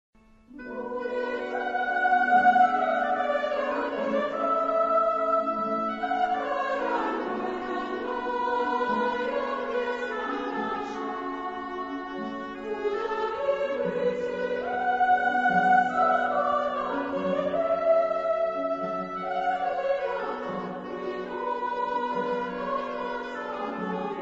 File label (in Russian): Музыкальный фрагмент